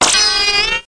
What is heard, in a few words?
Magic; Total Chaos Nukem 3d Pack; duke